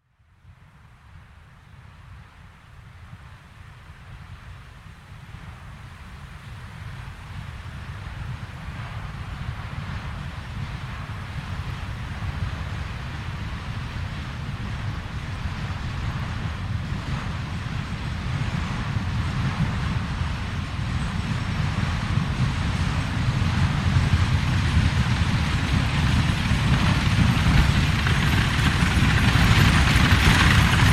Horse Drawn Carriage And 4 Wag; Horses Carriages and Traps; Hollywood Edge Historical Series

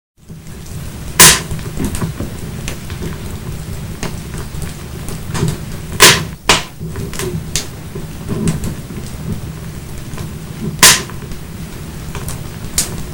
Log Fire; 100 sound effects

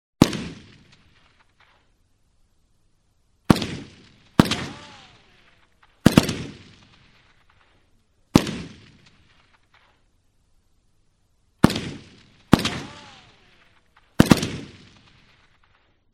M60 Machine Guns; SOUND EFFECTS1

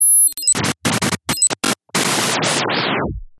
Chirp Break; Best Of N2O Library; Breakers & Transitioners

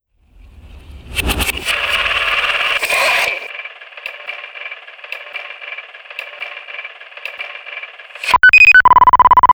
Retro Sounder, Stabs & Bursts; Best Of N2O Library

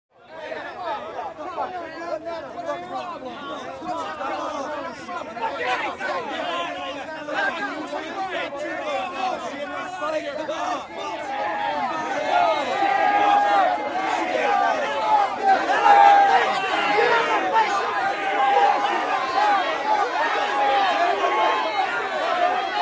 Riot Crowd Yelling Exterior; Interior Crowds

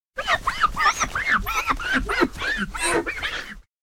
Window Wipe; 100 sound effects

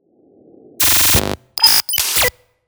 Tech Fart, Stabs & Bursts; Best Of N2O Library

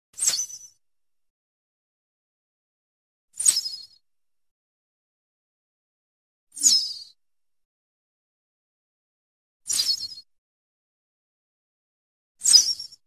Arrow Whistle Bys Light Arrow Zing By, Archery; Hollywood Edge Foley Sound Library

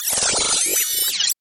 whoosh; Whooshes and sweeps

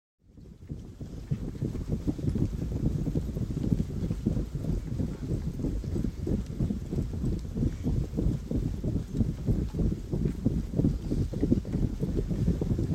Infantry Running Constant Men; Historical; Hollywood Edge Historical Series; War Horses Armies & Weapons

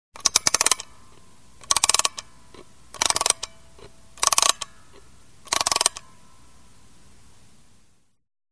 winding clock; SOUND EFFECTS1

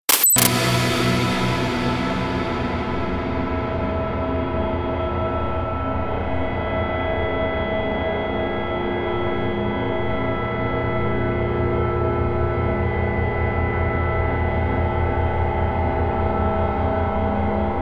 Techintodrone, Drones; Best Of N2O Library; Drones & Foundations

